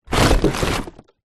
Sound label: household junk recycling boxes bottles compress push down in plastic trashcan